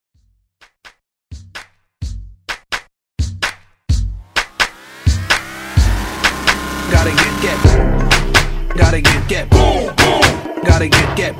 Throwback Redrum

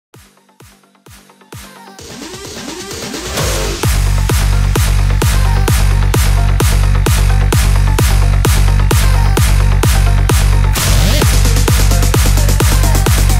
130 BPM

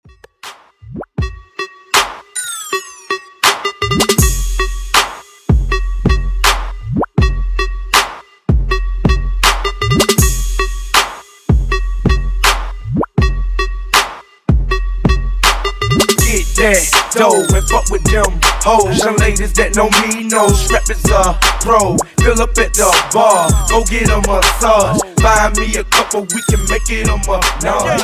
Hiphop Extended 80 BPM

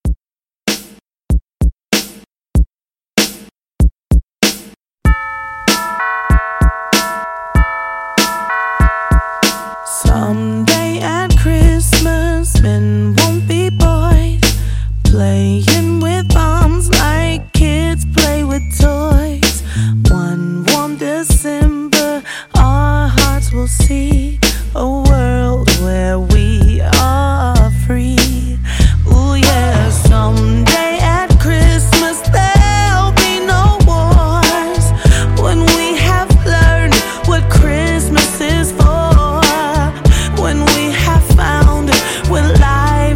Re Drum 96

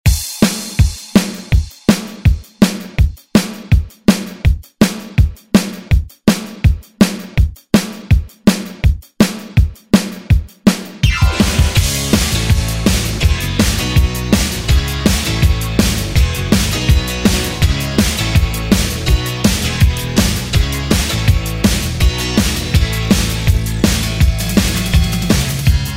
Re Drum

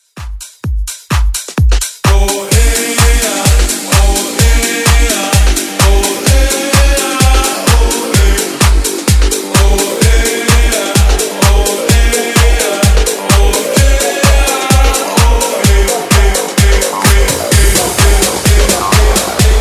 Dance Redrum

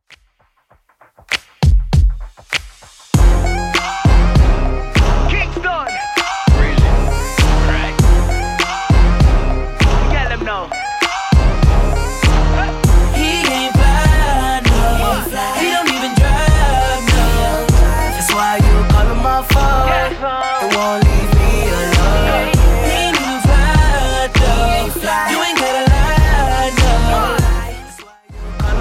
Rnb Redrum 99bpm

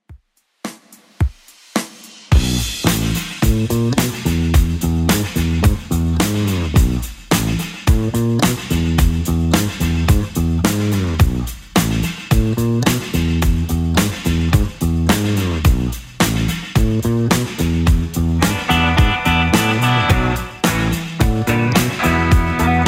80s Redrum 108 bpm

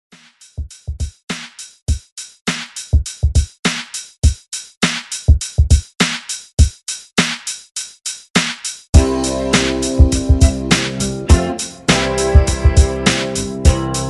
80s ReDrum 102Bpm; DJDOLLS

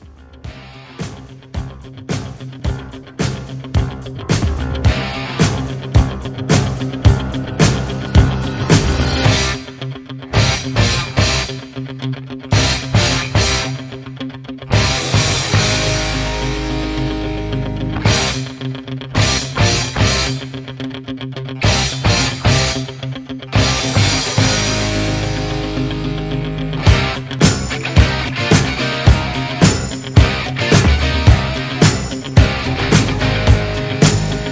80s Classic Rock Re Drum 109

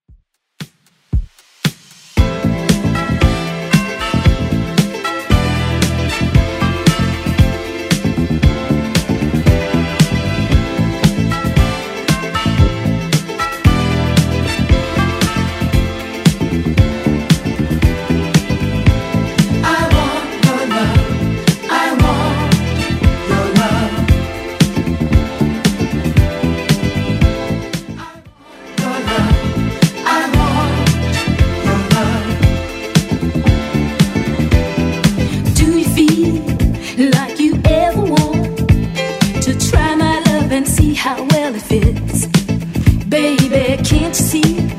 70s Redrum 115bpm

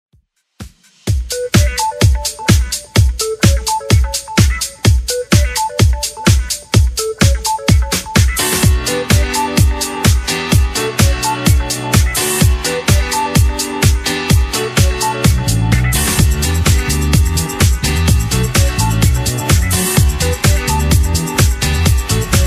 127bpm

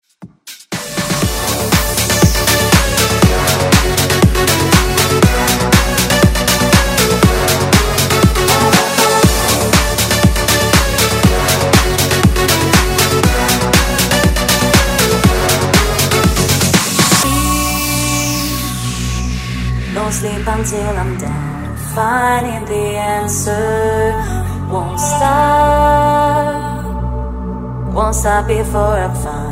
Pop Dance; Redrum

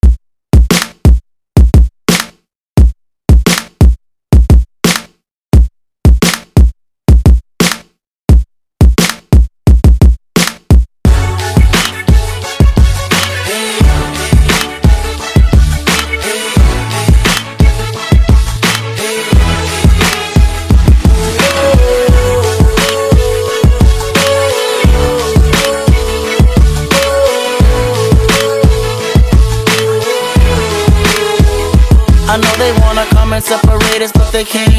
RNB; remixplanet

